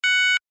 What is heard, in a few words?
alarm tone, sounder alarm tone; sounder beacon